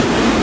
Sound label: hard bass drumz